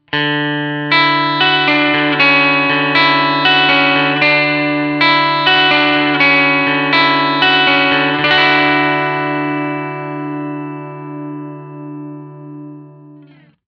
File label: podx3 blackface lux; line6